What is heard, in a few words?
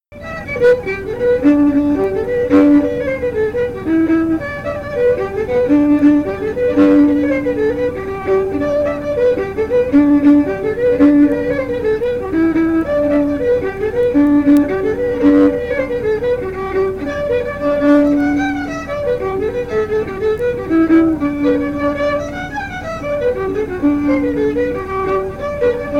fiddle; tell her i am